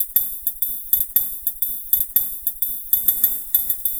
Pearly Hats Beat, Electronic Beats; Sonic Foundry (Sony Creative Software); Myths of Technology

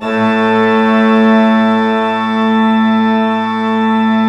PLENO A2, Cathedral Organ, PEDAL PLENO; Propeller Island

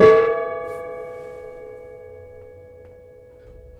Prepared Piano; Battery 3 Sample Kits

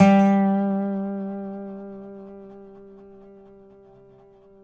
DOBSUSG3 -L, dobro notes; Country

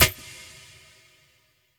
SD HOU, Dance Mega Drums; Best Service

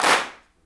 CLAPS, Africa Handclaps

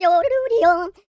YODEL; ILIO; Vocal Planet

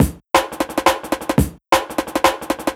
DNB BREAK

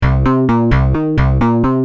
Rage, Bass; Industrial

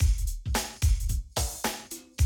Classic DrumLoops, 110

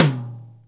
TOM, Drum Dry; East Collexion; Drum 1

